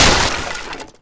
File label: wood destroyed; Angry Birds